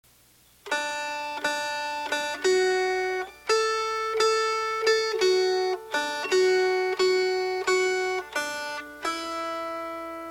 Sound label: Melody